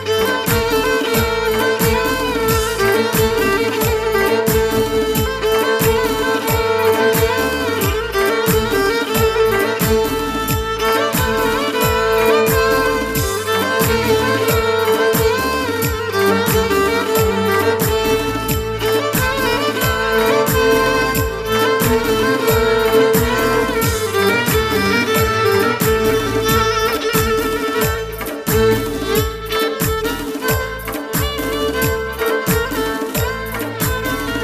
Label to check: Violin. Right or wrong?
Right